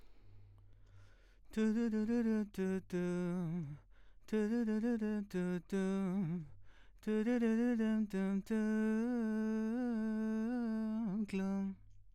VOX

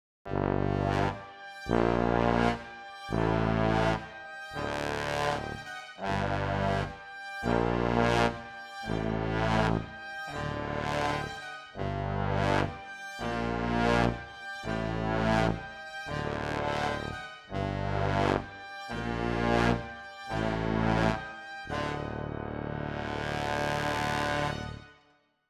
Brass

